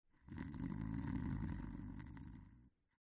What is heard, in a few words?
old man snore; Fruity Burst 2